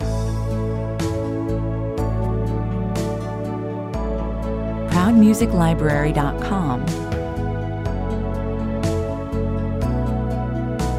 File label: tender heart 121 bpm